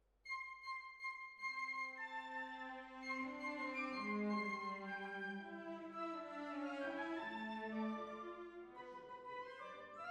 Hall, Jazz for piano

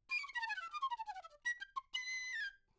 Flute

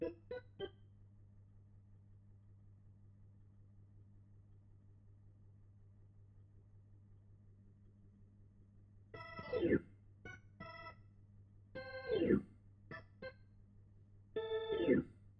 Hammond

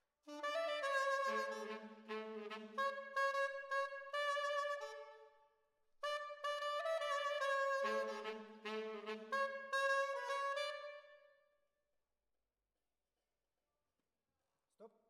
ORTF, Jazz for piano

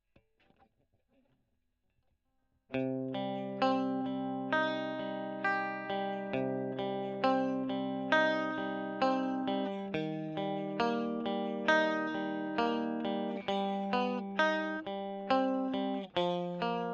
E.GTR Gefell

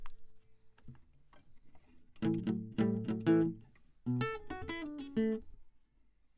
Classic Guitar

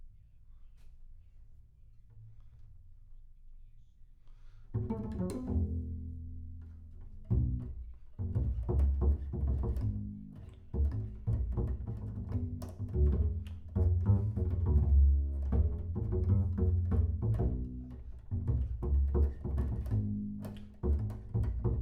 Bass Room

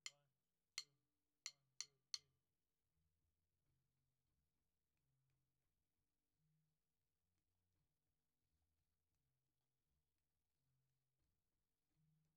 Floor

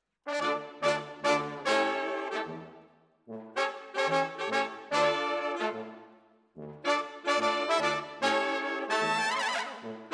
Brass

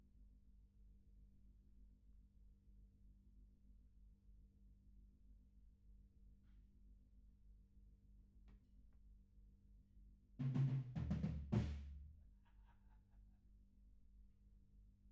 HAMMOND BOT

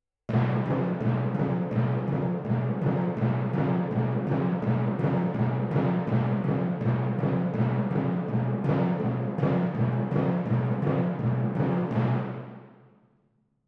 Timp

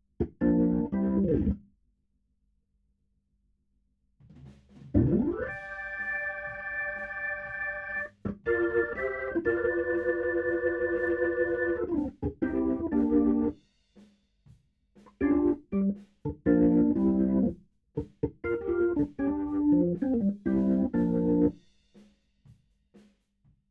HAMMOND BOT

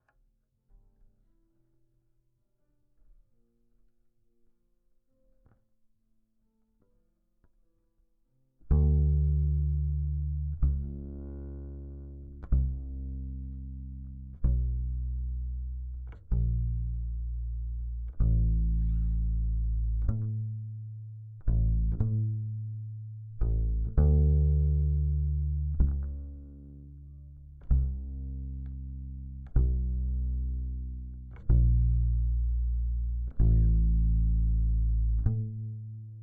Bass DI

